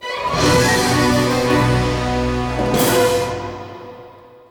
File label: 4th8th Place Goal Fanfare; crash bandicoot series sound box; Pirates of the Carburetor